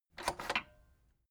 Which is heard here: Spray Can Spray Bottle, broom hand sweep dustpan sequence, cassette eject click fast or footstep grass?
cassette eject click fast